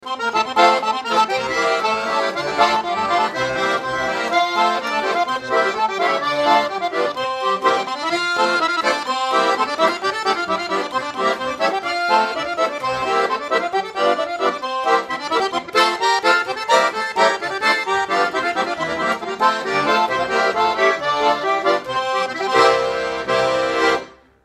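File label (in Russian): Баритон тенор две пикколки, гармоника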